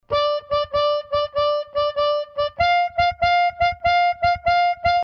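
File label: Side Pull Chromatic, harmonica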